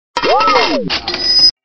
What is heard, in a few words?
Score Added; Kyocera KX2 Koi; ringtone